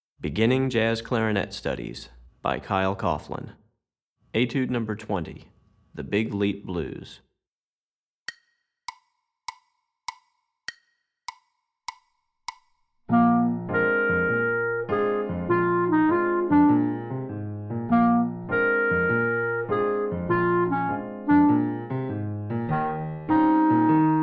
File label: Etude, Jazz Clarinet; Recordings For Beginning Jazz Clarinet Studies, Volume 2; Etude #20; More Syncopation